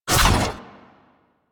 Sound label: Glaive Whoosh; Warframe; Weapons; Ghost Catcher